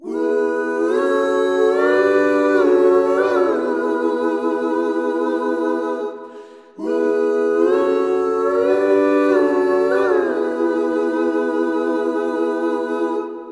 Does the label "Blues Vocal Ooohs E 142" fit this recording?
Yes